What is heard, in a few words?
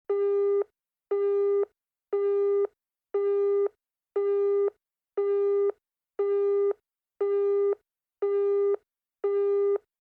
telephone